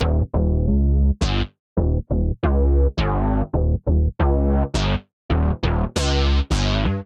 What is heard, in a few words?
Ab Major 136 bpm, Bassline; MIDI Clips; Tonal; BazzHouz; Skitter and Step; Ableton Factory Packs